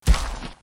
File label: Walk; metrocraft; customnpcs; nosach